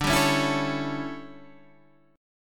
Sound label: D Major 7th Suspended 2nd Suspended 4th, chord, Guitar Standard; DM7sus2sus4; strummed; JGuitar